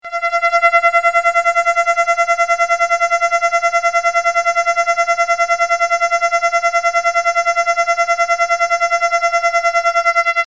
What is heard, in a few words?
Scanner Pulsing Warb, drones